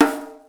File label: Djembe, Afrohouse; Afro Drums; One Shots